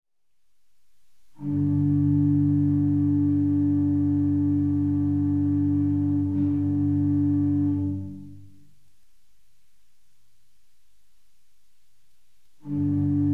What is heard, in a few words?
prelude organ; 6 preludes (organ)